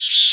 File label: hit bat